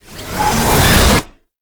Cast Riser; Warframe; Uriel; Remedium

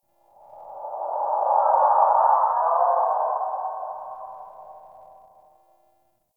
Ext Eerie Howl Cry; Ambience; Misc Outdoor; Warframe; Infested Eris

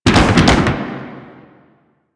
Motorcycle Slide Boost Backfire; Vehicles; Warframe; Motorcycle Ninety Nine

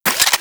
Anti Aircraft Gun Mech End; Weapons; Warframe; Archwing

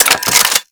Reload; Warframe; Latron Prime

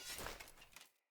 Short Sword Accent; Weapons; Player

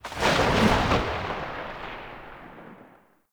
Alt Fire Tail; weapons; Warframe; Corpus; Tenet Quanta

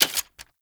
SMG Reload B; Tenno; Sundial

